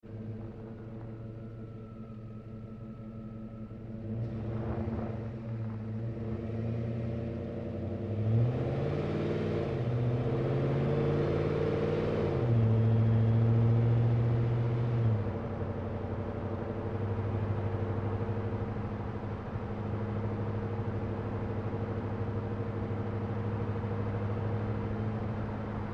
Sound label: Truck GMC Savana Onboard Drive Accel Decel Slow; Trucks; Big Room Sound